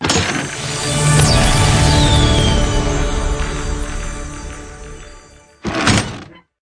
pick And Click; fairyred

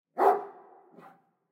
ambience dog; dead or alive 2

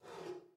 beer slide; goldentavern

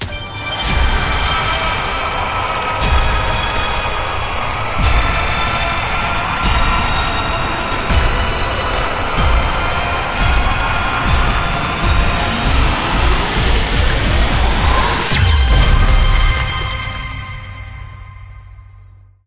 super mega win; dracula

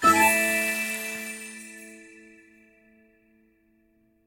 character Small Win; finn

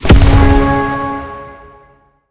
last explosion; silverback

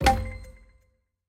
explode lion; serengeti kings

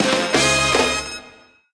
small Win; piggy riches

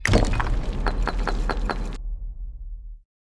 quick spin button click; secret of the stones

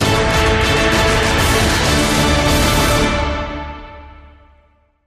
outro Free Spin; warlords

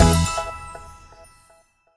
big Win Stop, slots; king of slots

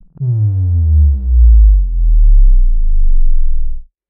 sub drop; spinsane

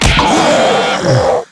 fs Boss Hit Heavy; wild worlds

